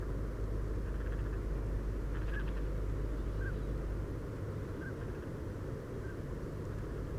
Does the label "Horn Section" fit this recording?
No